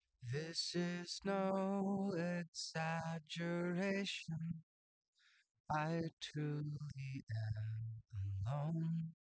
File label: wdrums inst; SiSEC08; SiSEC underdetermined